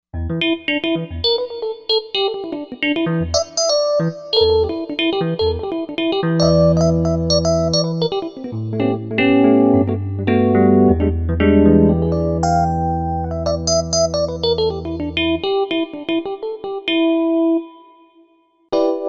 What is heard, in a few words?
Ring Mod EP